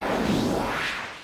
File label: Pass Level; Codename Countdown